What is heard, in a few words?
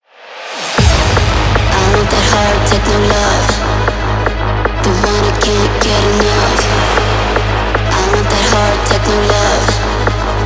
Hard Techno